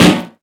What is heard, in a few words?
sharp acoustic snare sample D key; Duration 0:01; Channels Mono; snare drum samples